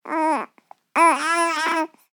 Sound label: Voices baby three weeks old cry angry